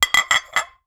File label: Saucers Put Away, Kitchen